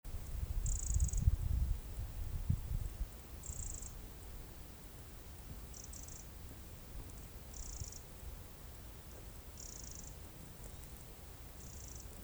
Troglodytes troglodytes; SETE

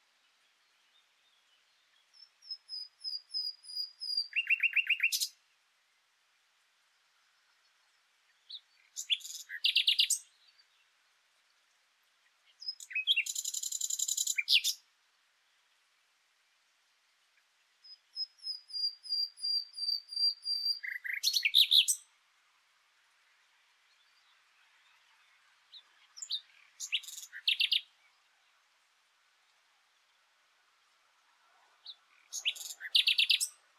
Luscinia megarhynchos; SETE

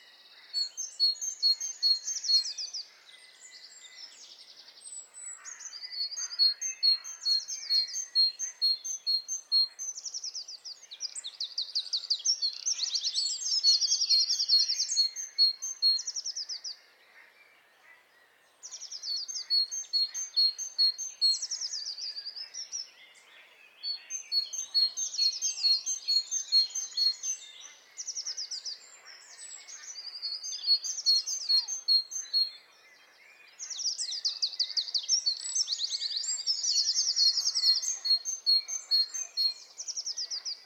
Parus major; SETE

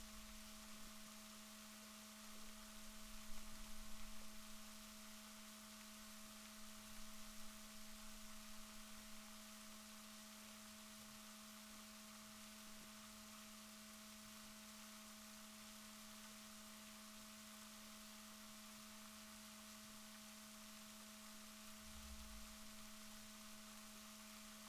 rorquals positif, Balaenoptera Physalus Chile

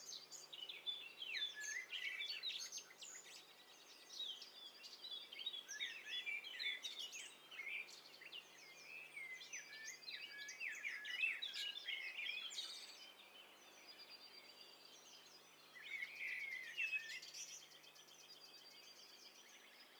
STEREO BIRD COCKTAIL PARTY

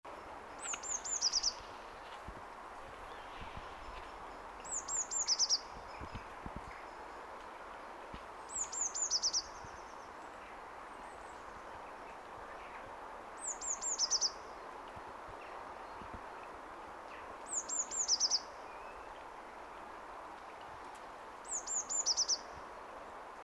Cyanistes caeruleus; SETE

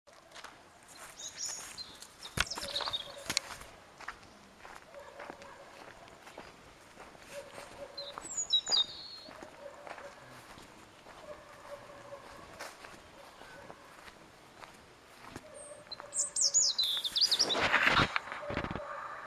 Erithacus rubecula; SETE

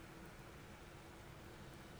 pulses, BALAENOPTERA; MONACO 2022-07

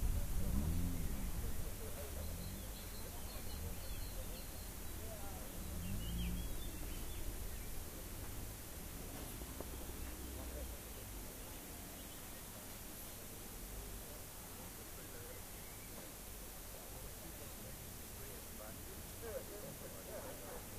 rayol farm; SEAMED

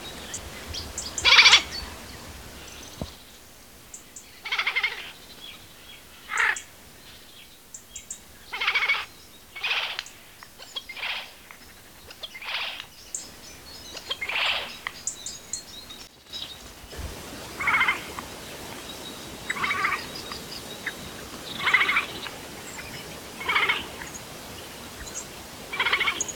Garrulus glandarius; SETE

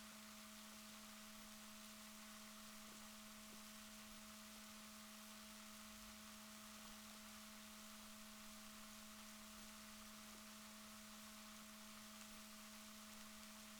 rorquals positif, Balaenoptera Physalus Chile

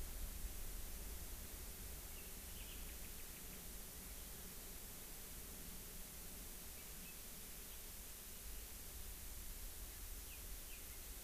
rayol farm; SEAMED

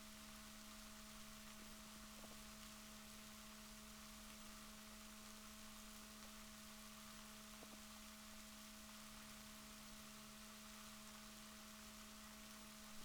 rorquals negatif, Balaenoptera Physalus Chile

